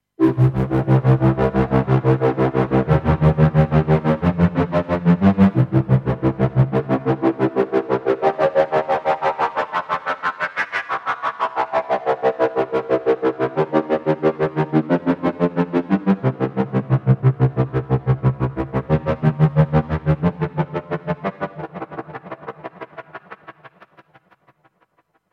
ZitterSequence

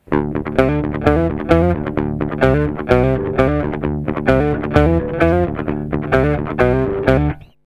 Kanal 2 Mittenboost Shape Ultrabass

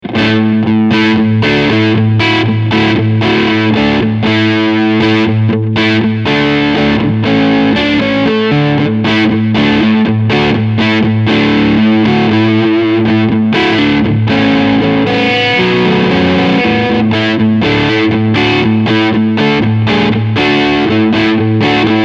Crunch Riff beide PUs

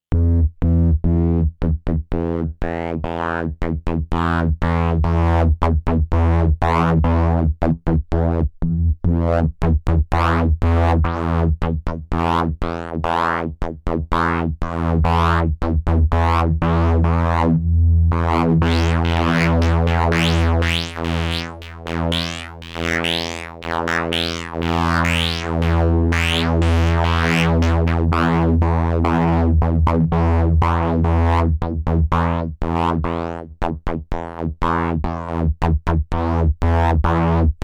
Filter in Serie

